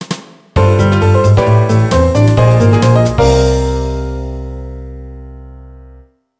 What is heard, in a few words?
Jingle; Gran Turismo 4